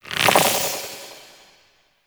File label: Sentient Threat Bubble; Railjack Hunt